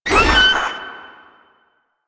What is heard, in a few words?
Maggot Attack; Enemies; Vocals; Warframe; Proto Infested